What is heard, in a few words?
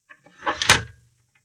indoor Lever Success; Keepsake